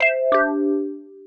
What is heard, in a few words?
Mail Alert